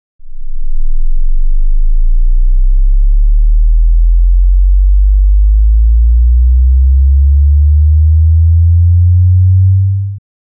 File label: sweep Stereo 15Hz 100Hz; 3dBFS